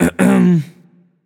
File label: nv clear throat; smite; voicepack; mercury